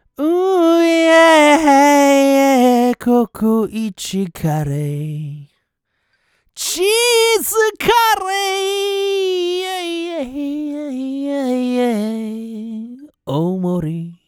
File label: Vocal Chop; CoCo壱トラック